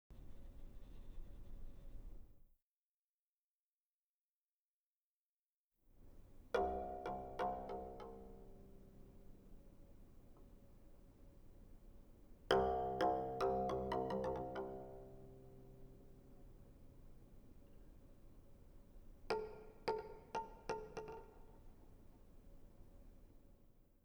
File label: Little Bells